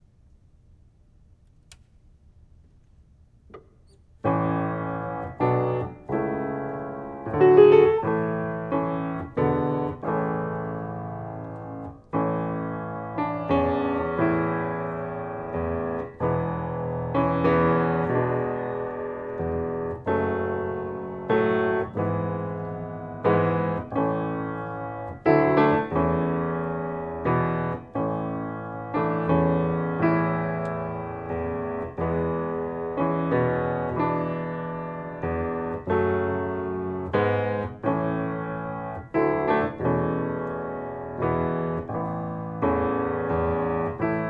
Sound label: tema 32bar 90bpm; Mozart